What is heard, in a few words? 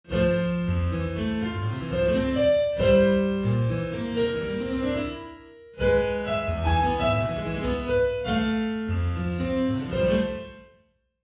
the non musical pianist